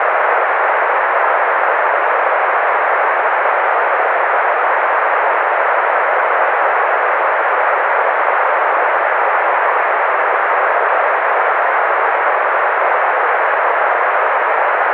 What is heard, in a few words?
noise